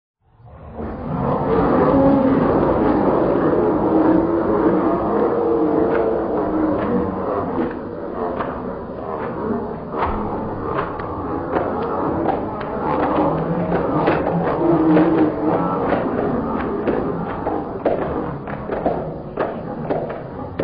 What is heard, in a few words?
laugh track; vapesquared